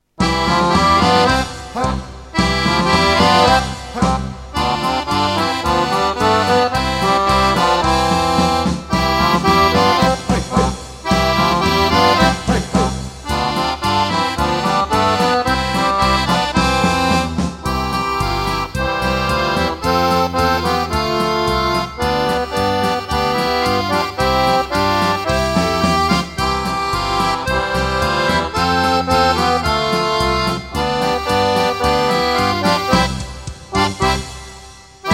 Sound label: Klezmer